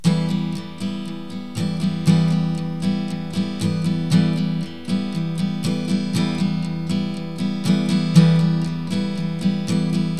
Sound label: Em drone